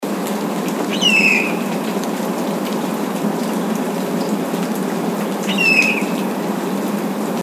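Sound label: Steller's Jay Red-tailed Hawk call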